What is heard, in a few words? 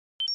Computer Beep High O, beeps